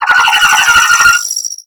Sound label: Space Drone Attack; Warframe; Corpus Space Drone